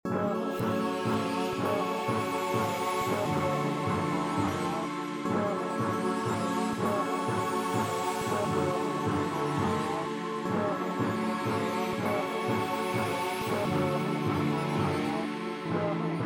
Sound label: techno techno